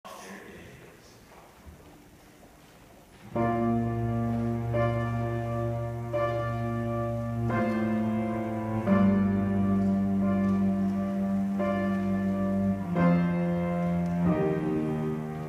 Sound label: String Quartet